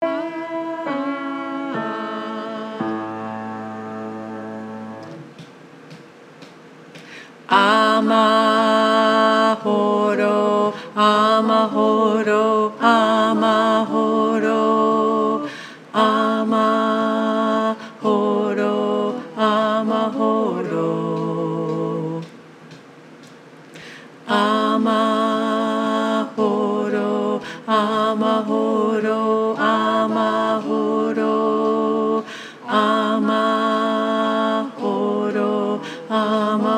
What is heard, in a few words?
Tenor, choir